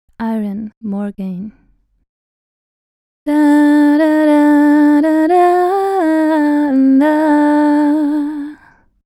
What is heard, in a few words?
SH Gama Iron more Gain